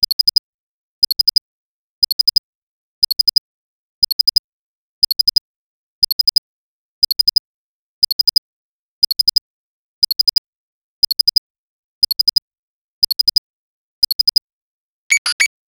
a countdown timer followe; events

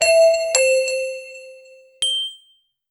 bright sound, achievement

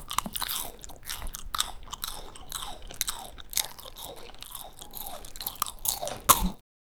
girl eating fries

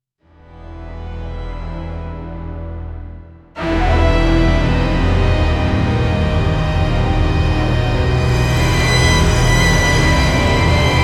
intensely scary suspense, dissonant

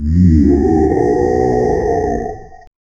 deep guttural demon chant

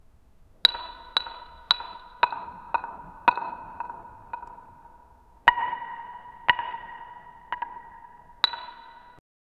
knocking on stone with, stone

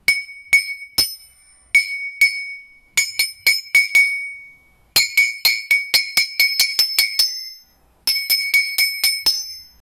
a loop of sound of, blacksmith

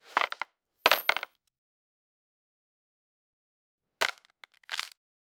treasure wood open rpg

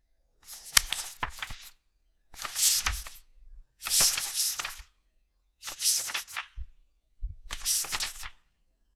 page turn, paper

